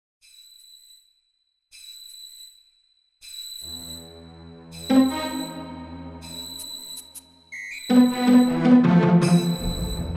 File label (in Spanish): musica incidental; para cuento chejov